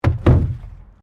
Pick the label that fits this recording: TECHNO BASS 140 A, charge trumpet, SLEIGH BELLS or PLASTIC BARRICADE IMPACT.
PLASTIC BARRICADE IMPACT